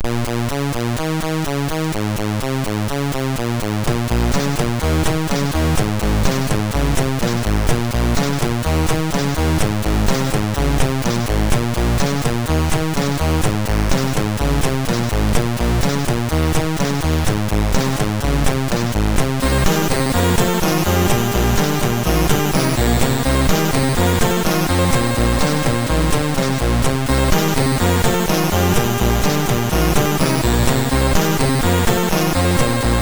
Hard, MOS6581R4

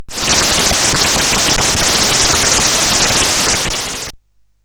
sid CSG8580R5; California Games

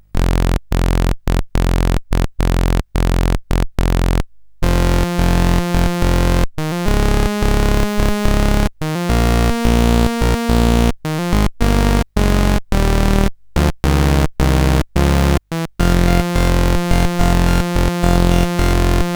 sid, CSG8580R5; Escape from New York

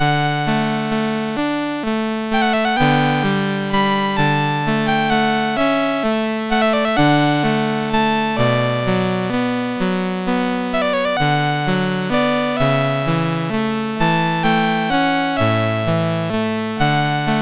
Serenade, MOS6581; MOS6581R4

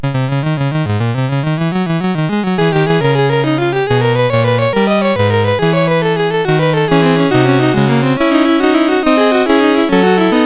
Fugue No4 in C Minor, MOS6581R2

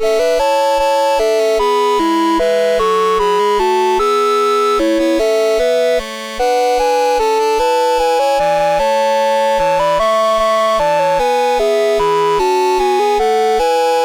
MOS6581; MOS6581R3

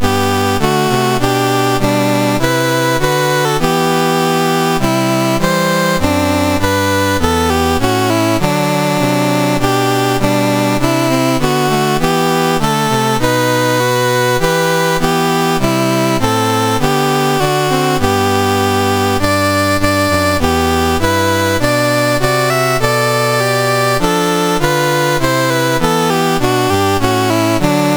New Zealand, National Anthems, MOS 6581

